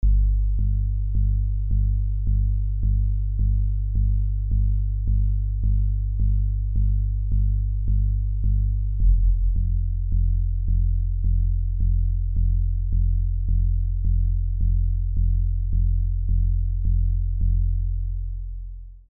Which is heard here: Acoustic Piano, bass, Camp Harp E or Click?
bass